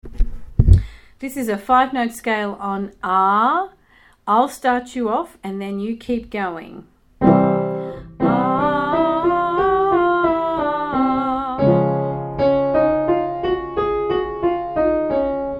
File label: 5 note scale; singing exercises